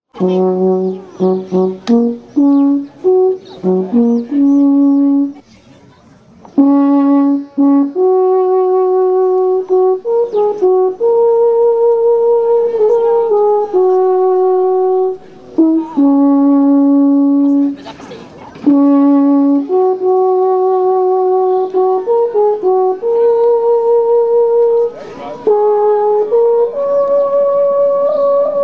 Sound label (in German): Alphorn; pilatus